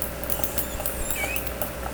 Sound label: call, Orca; SRKW; Alwin; S10